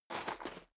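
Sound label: step high heels gravel medium scuff, footsteps; schritte; menschen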